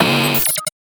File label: Drone; Rabbids Multiverse